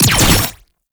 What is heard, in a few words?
Gunbrella Alt Mech; Weapons; Warframe; Tenno; Koumei